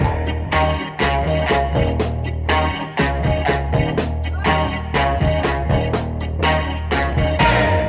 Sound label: guitar, Loops, Phonky